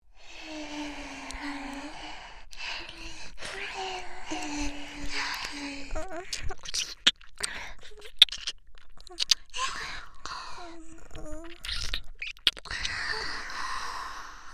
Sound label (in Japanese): 左右同時耳舐め